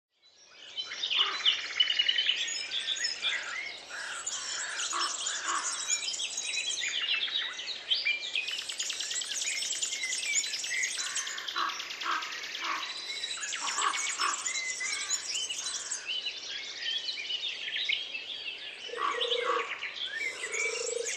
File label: Peaceful forest ambience with birds